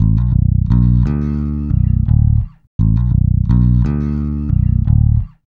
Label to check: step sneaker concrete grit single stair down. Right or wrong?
Wrong